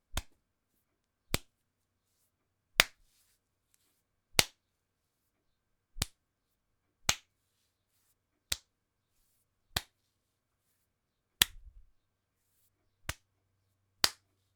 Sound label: Arm Grab